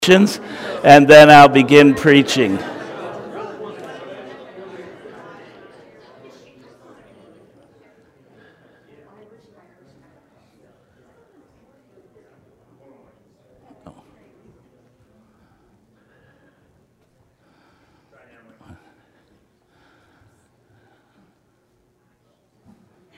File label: Wind Chimes And Rain